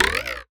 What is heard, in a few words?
Spoon, Foley; Foley Fx; cartoon; musicradar; cartoon caper